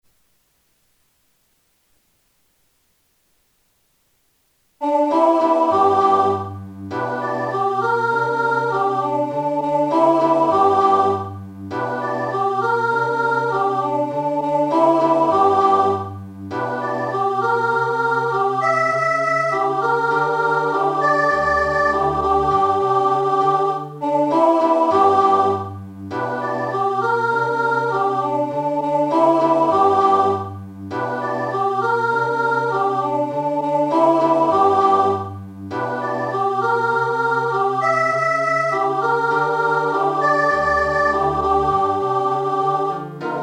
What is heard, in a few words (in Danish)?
melodi